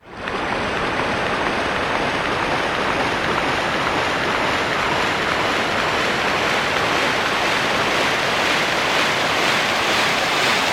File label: sea shore; tonleiter